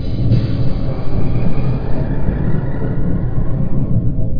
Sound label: factory; machines